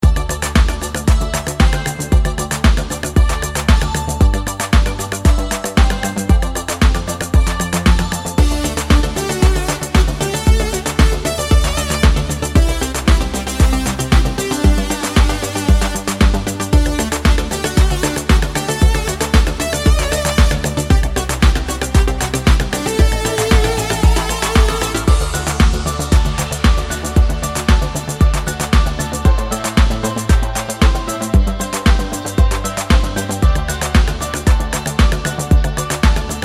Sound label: Koto - Overdrive